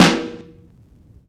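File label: Vinyl Snare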